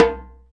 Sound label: PER Percussion